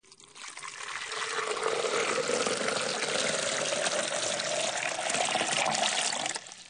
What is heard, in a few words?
water sound